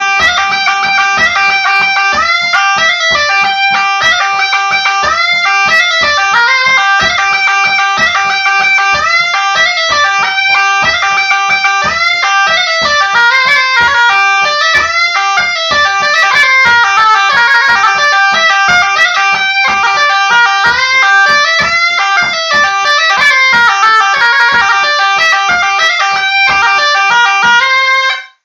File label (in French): La Bourrée à Régis; Bourrees